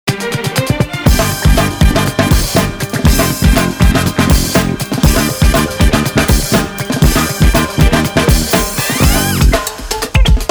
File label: horny hornz, breakbeatz